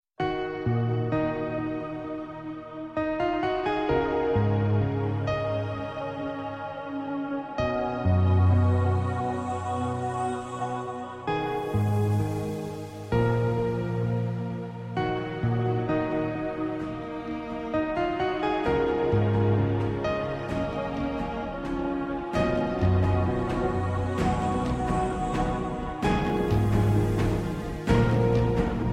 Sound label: Slow Music